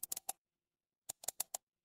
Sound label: office mouse click